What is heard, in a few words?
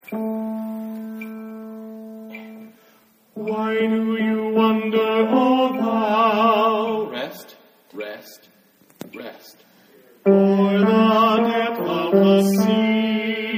Tenor